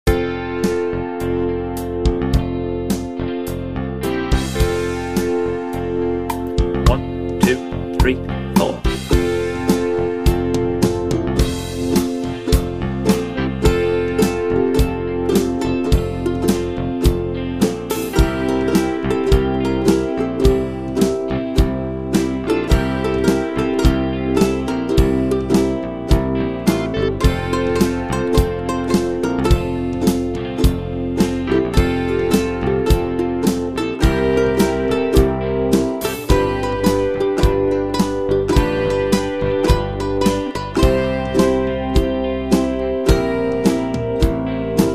Ukulele

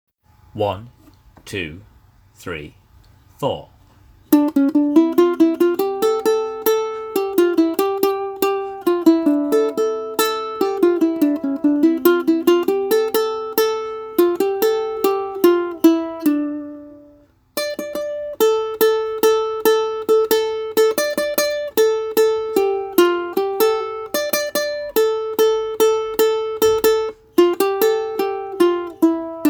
uke

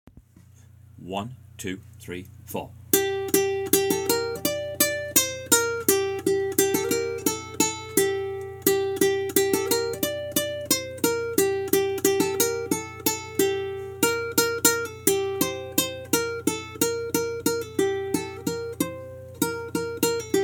Russian dance, ukulele

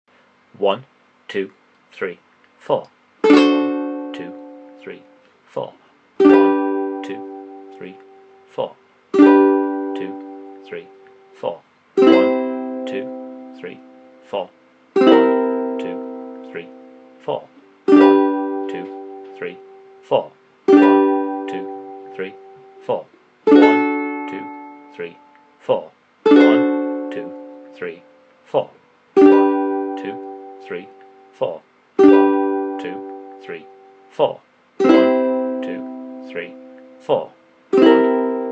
chords, ukulele

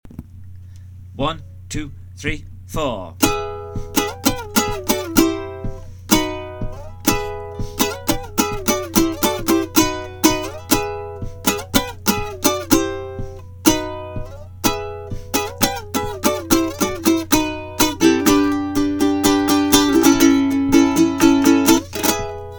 riff, ukulele

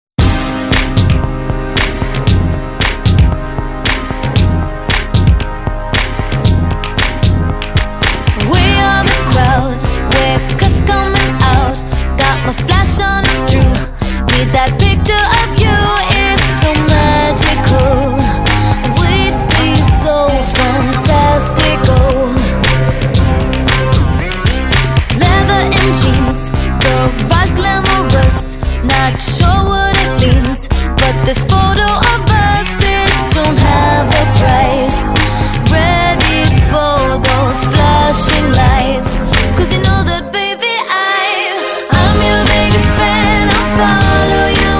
Backin

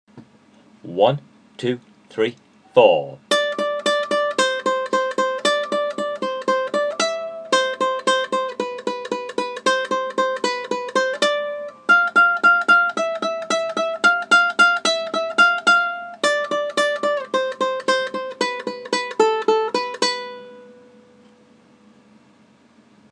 tempo, ukulele

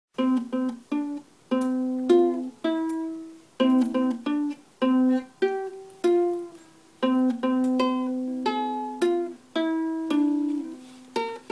happy birthday, ukulele

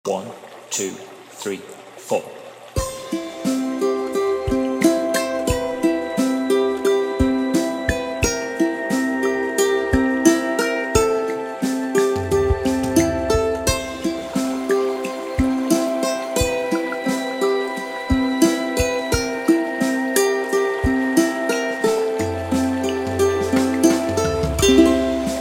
uke

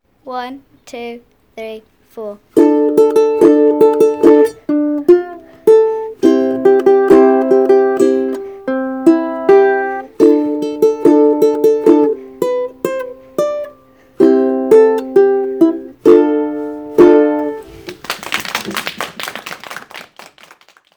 Drunken Sailor, ukulele

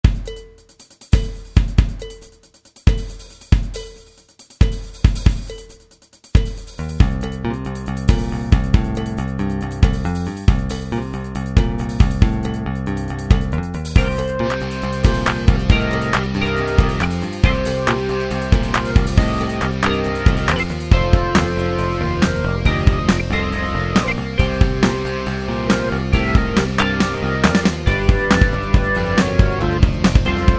blues, ukulele

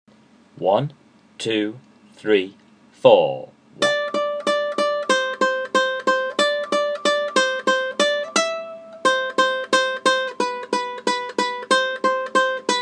slow tempo, ukulele

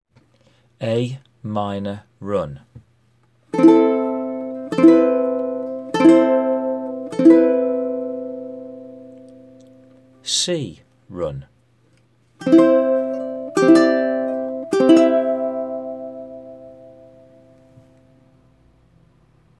Knock Runs, ukulele

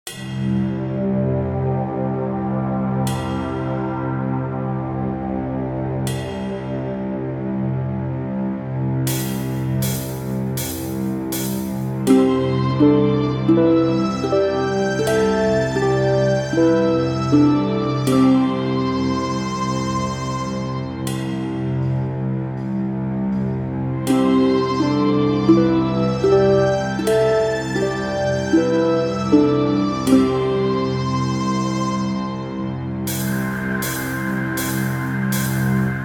Penta Oriental

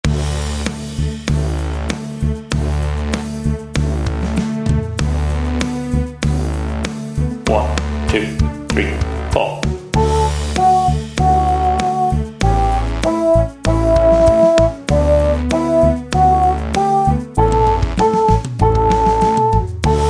German Dance, ukulele

